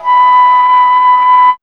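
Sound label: flute tone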